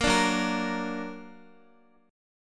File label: Gb Augmented Guitar; x,x,8,7,6,5; Gb+ strummed